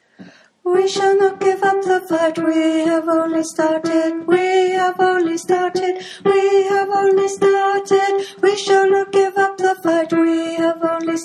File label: alto